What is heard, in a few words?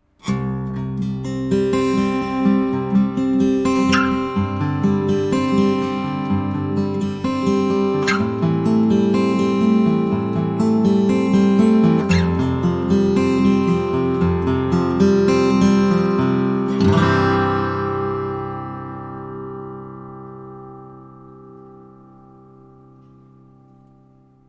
Lowden Baritone sound impression; by Eddie van der Meer